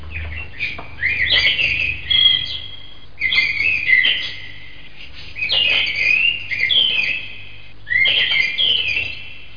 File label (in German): vogel